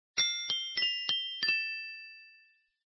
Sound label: Respiration